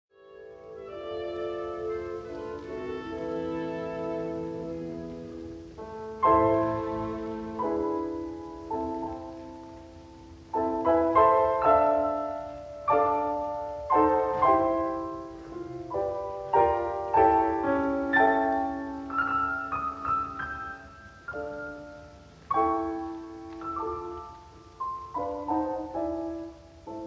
Piano Concerto in A minor Allegro affettuoso Andante espressiv; Schumann; Op. 54